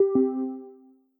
Low Battery; ZUI 13

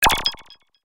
Electricity instant message; Nokia; audio themes

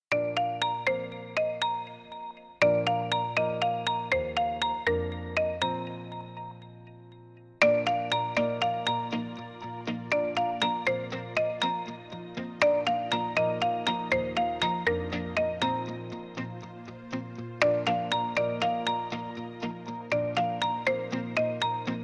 Crystal Clear, ringtones; Tecno Spark Go 2023

